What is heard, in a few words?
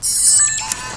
Camera